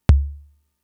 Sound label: Kick, Drum Machines Fricke MFB-522